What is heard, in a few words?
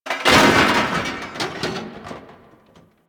desktop metal destroy; ambience; Warframe; Entrati